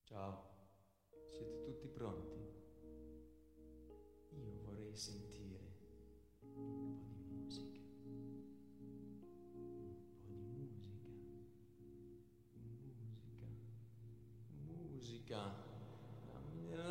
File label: Jingle